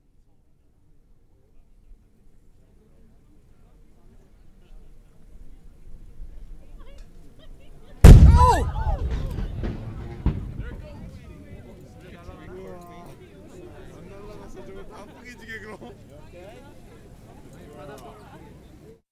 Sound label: Quieting